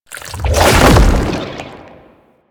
Proto Infested Tentacle Flail Start; Warframe; Babau; Abilities

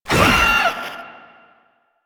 Proto Infested Maggot Attack, Enemies, Vocals; Warframe

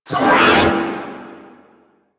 Stomp Cast; Warframe; Caliban

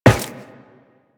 Run Metal, Footsteps; Warframe; Tenno

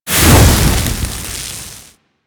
Fire Blast Vortex Extinguish; Warframe; Ember

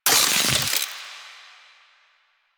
Epitaph Prime Reload B, Weapons Sidearm; Warframe; Tenno; Wraith Sidearm

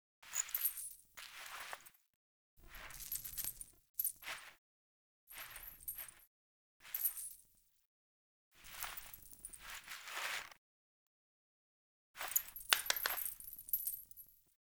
Foley; Friendly; Verminia; Bromius Quest

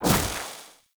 Casting Device Impact Default; Weapon Impacts; Player; Casting Devices; Ode Casting Device

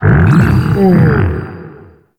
UI Open; Shroom Prince; Warframe; Nokko Colony; Vendors

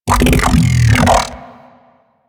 Mech Ambient Vocal; Nechro Mech; Vehicles

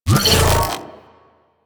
Shockwave Leap; Warframe; Venus; Venus Terra Shockwave Moa; Abilities

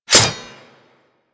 Dagger Metal Impact; Dual Daggers; Hit Effects; Weapons

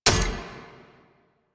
Sticky Bomb Proj Land; Grineer; Veilbreaker; Vor

